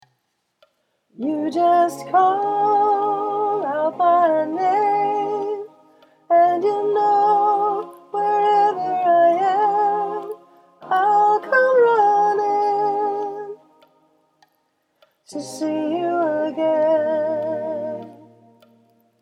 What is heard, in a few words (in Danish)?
SOPRAN